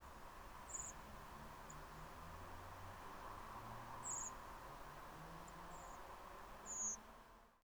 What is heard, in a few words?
secondary modulation, Redwing Turdus iliacus; Poole, Dorset, England, 22:15, 29 October 2016; Paul Morton